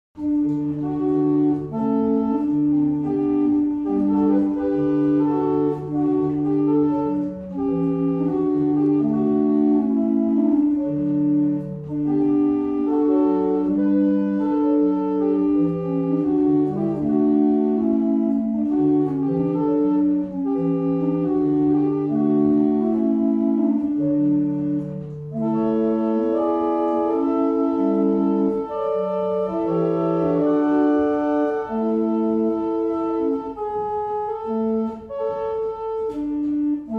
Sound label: Easter Hymn, barrel organs; Cardeston